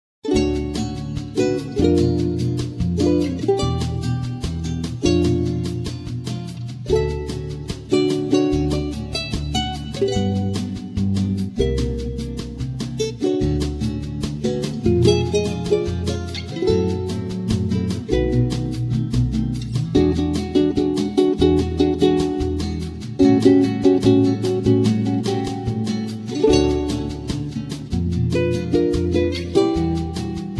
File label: Samba